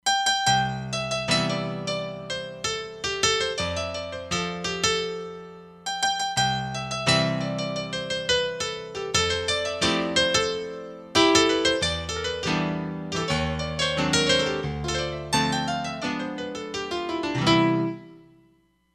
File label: E-Grand, Roland Fantom XR